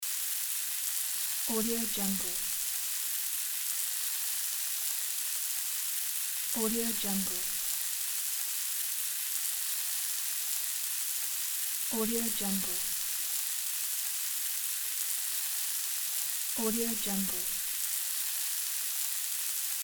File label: sizzling loop